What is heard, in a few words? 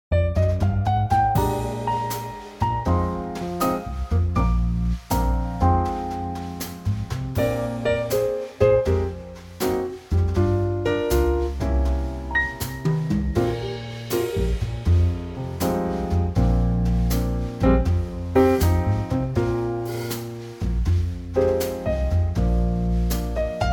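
E flat trio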